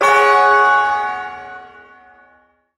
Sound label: Ersatz Shawzin Yo Chord G; Warframe; Duviri